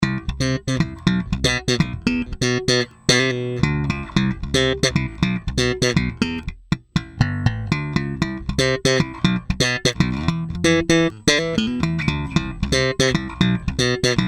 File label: Actif Slap, basses, sadowsky; Sadowsky UV60